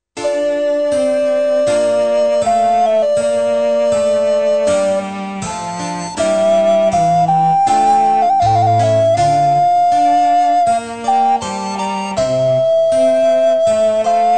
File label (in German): Sonate Nr 6 in d Moll Largo